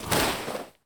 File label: Baseball Slide Grass